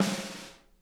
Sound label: snare, drum kits